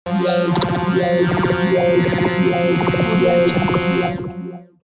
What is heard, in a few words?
cinematic hit alien synth; smartsoundfx